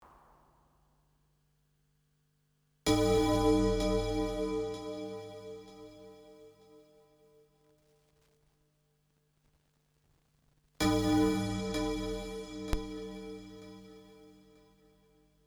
MINIFREAK

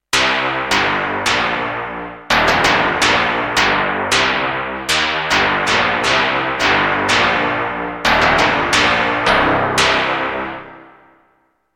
Fairlight Low Bang processed

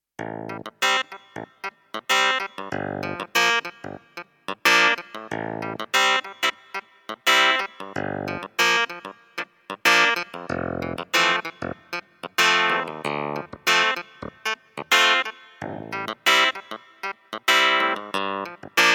SP170S Clavinet

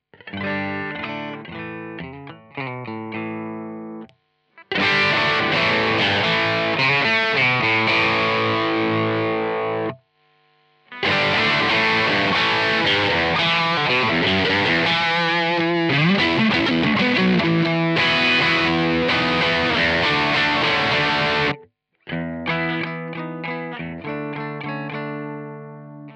Screamer Kemper; JHS

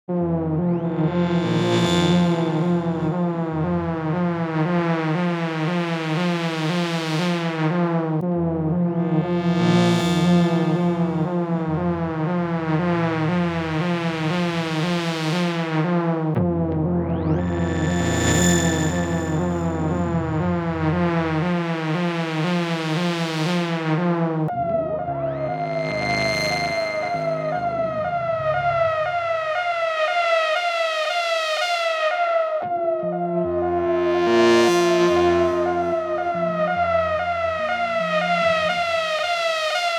Siren Lead FX Sequence; Theta

